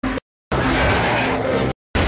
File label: roar; gman